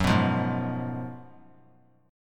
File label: Gb Major 7th Suspended 2nd Suspended 4th; GbM7sus2sus4 strummed; JGuitar